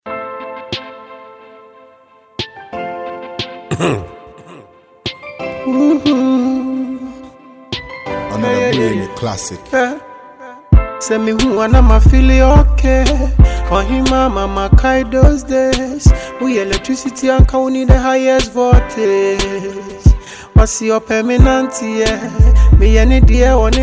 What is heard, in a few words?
Riddim